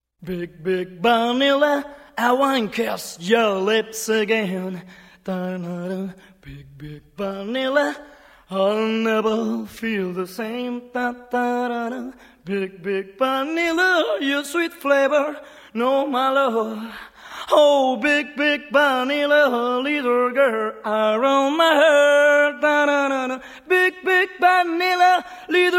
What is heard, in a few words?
acapella